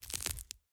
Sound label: plant, walking; Factorio